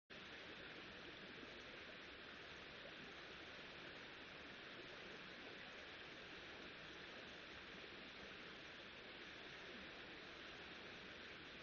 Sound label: riverside; Kyoto U; Ashiu